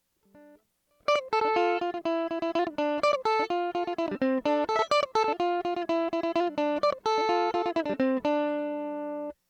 mandolin comparison electric srm

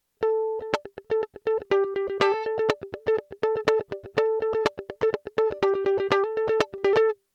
mandolin comparison electric high single

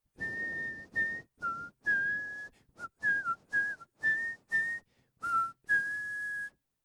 whistle complex input trimmed

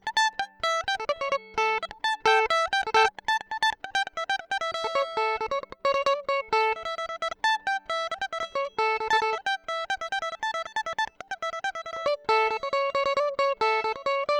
mandolin comparision pickup high melody

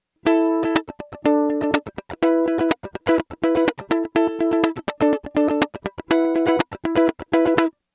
mandolin comparison electric chords srm

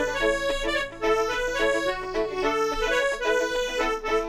accordion

